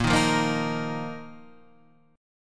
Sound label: A sharp Suspended 4th Guitar Standard; strummed; A#sus4; JGuitar